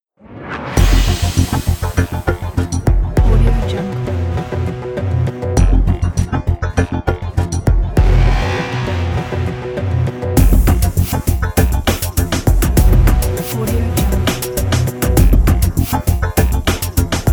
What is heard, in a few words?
digital tech logo